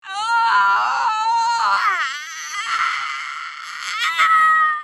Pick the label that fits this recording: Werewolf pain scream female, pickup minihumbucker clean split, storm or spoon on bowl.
Werewolf pain scream female